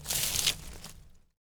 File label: clipper weed output; gmod